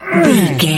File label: voice male effort action release